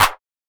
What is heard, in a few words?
CLAP